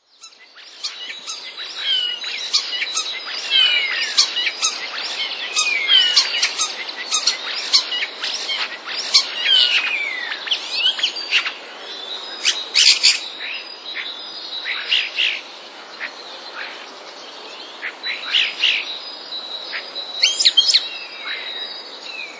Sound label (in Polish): szpaki, długie nagranie, na którym będzie można usłyszeć głosy świadczące o niezwykłych możliwości; wroblowe; zapozyczenia